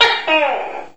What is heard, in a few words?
notif